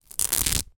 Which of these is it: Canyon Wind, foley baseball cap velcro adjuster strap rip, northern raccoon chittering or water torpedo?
foley baseball cap velcro adjuster strap rip